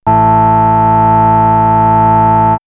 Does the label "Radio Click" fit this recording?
No